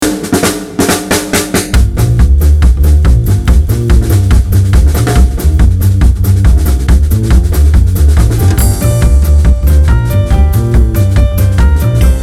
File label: instrumental